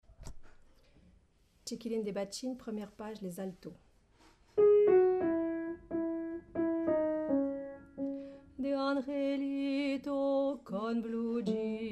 Alto

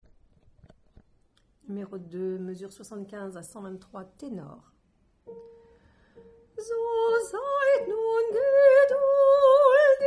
Tenor

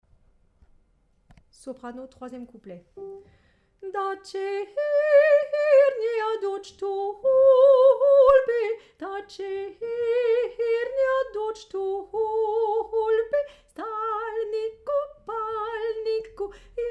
Soprano

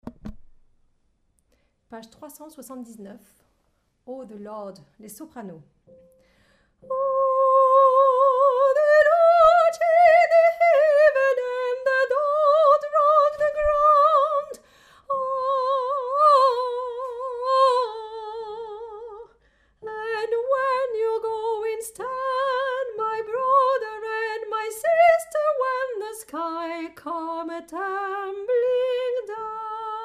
Soprano

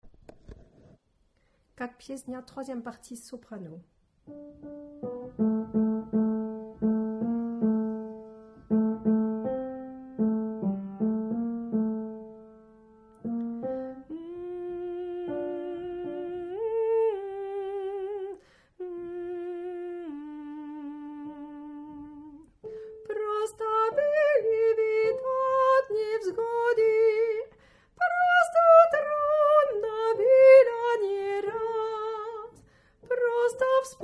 Soprano

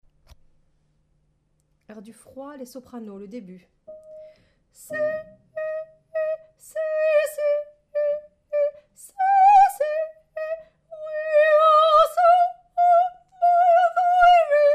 Soprano

